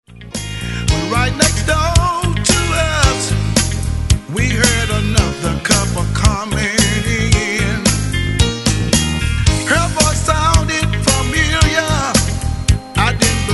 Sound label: Moans, Grunts and Groans